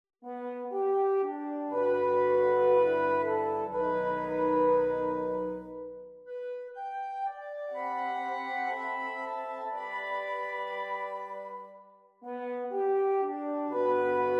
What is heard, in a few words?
Romance; 2 Bb clarinets, 2 horns in F and 2 bassoons